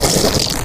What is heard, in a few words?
player enter stick paint